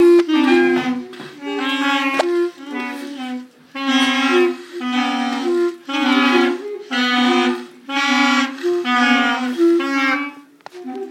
practising changing keys

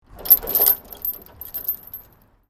chain tinkling; near castle hill garage

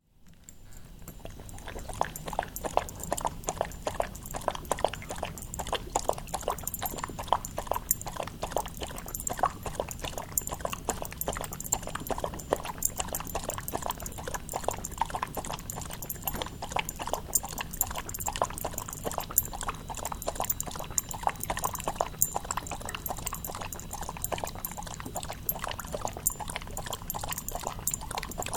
drinking water

